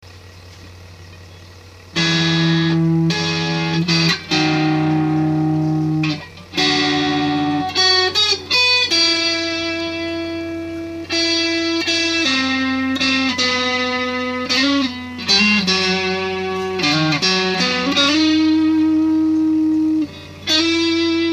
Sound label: mini guitar